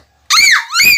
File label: uwuwuwuwuwu